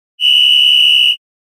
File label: whistletone